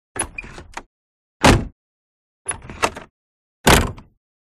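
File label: Door Open Close Austin Ruby, austin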